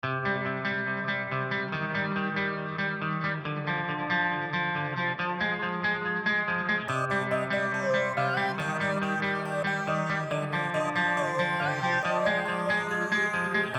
dubstep snippet

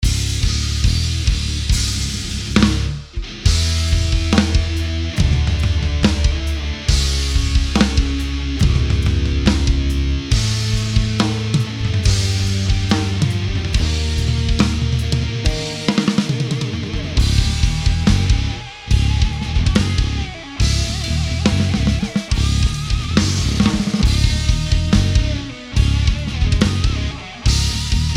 metal stompers

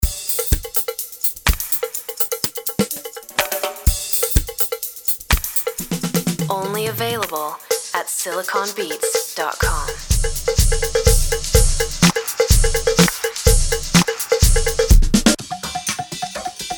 disco drums voice duck

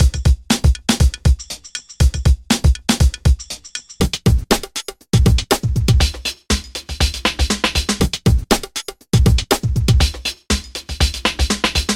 electronica

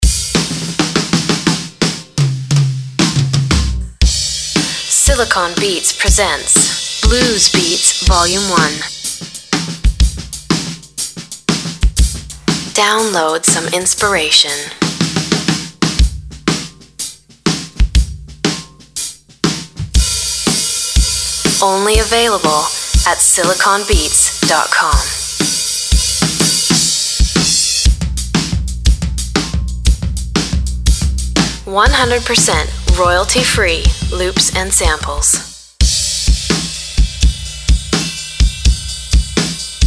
blues beats

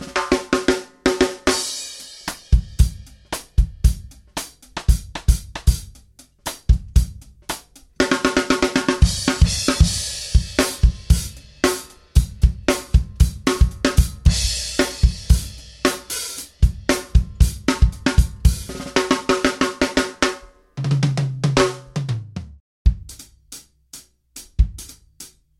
ska drums mix

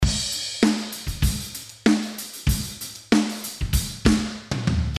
emotion example mixed

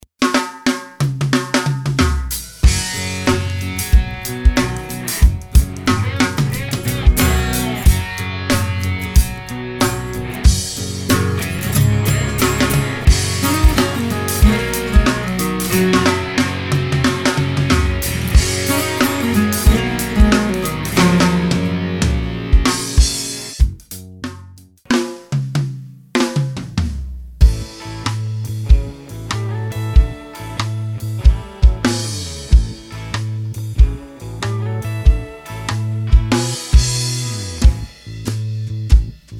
modern country

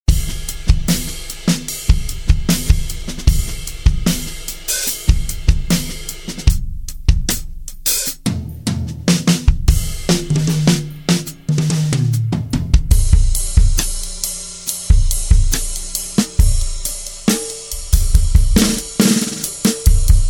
chill lounge

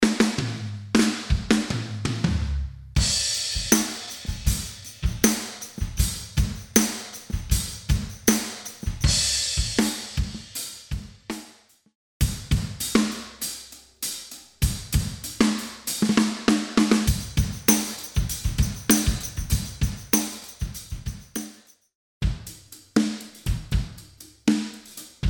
classic soul; MEGA pack

